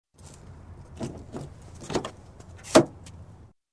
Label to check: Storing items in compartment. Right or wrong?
Right